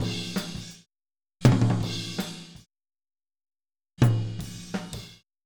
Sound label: Boogaloo Fill Tom Rack, 82 BPM, Drums; Sonic Foundry (Sony Creative Software) - Drums from the Big Room The Mixes